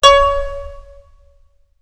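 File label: HARPSICHORD STOP C#5; Best Service Advanced Media Trax Modern Composer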